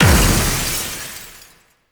Big Glass TV Destroy; Warframe; Nineteen Ninety Nine

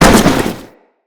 Fall, Foley; Characters; Tenno

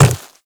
Infested Footsteps Quadruped Medium Walk Dirt

